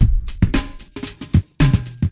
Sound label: Drumloop